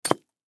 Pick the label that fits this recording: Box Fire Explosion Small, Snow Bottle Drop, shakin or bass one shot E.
Snow Bottle Drop